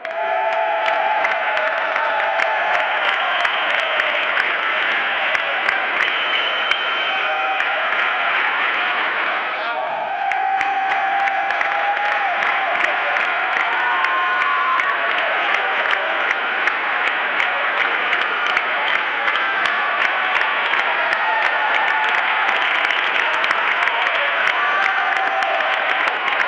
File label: a loud stadium crowd chan, crowd